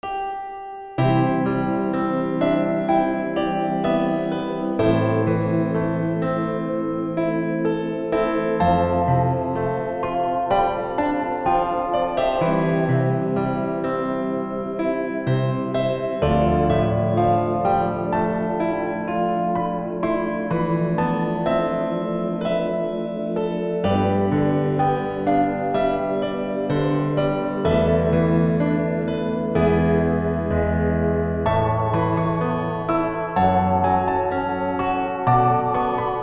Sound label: Piano part